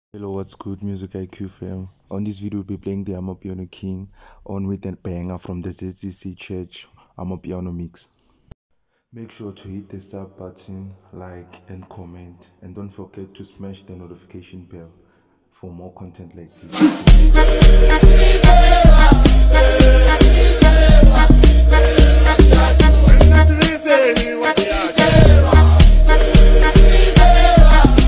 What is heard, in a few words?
Amapiano Mix; ZCC